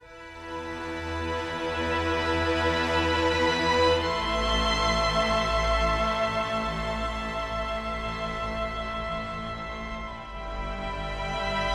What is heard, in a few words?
Ambient